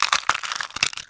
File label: dull thump; The Elder Scrolls III - Morrowind; Morrowind GOTY GOG